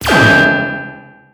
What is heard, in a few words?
laser; dreambuilder modpack; technic